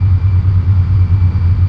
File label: Ship Idle